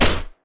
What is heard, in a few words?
Suppressor